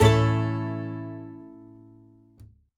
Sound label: Jingle Dice Open; clubhouse games 51 worldwide classics 2020 switch gamerip